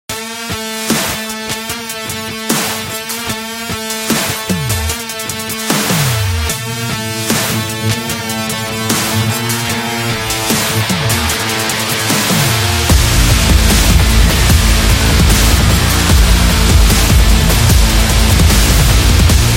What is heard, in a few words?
Dubstep Metal